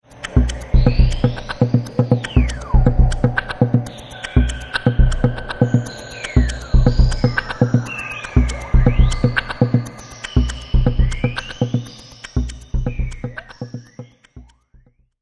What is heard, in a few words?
jungle